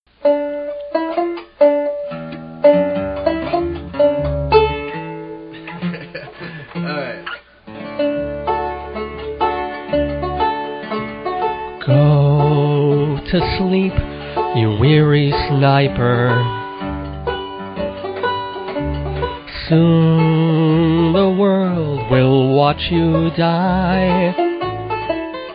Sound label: Lullaby